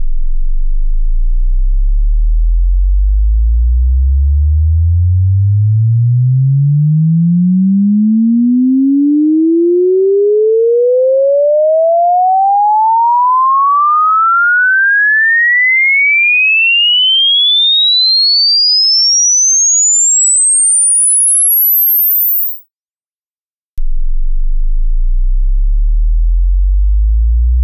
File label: test sweep